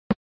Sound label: SNES Golf Ball Bound